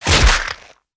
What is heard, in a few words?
human hit